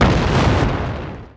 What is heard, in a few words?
space platform explosion; Factorio